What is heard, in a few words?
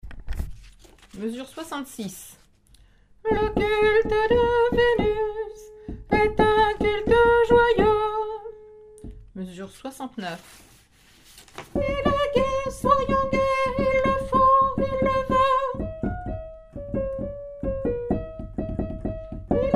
tenor